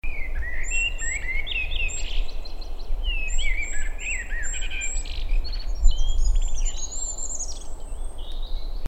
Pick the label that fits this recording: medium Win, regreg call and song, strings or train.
regreg call and song